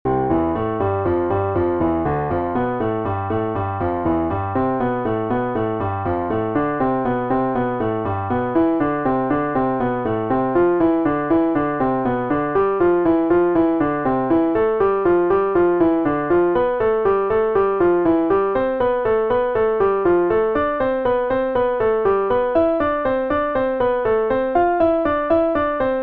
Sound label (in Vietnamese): bai tap luyen ngon piano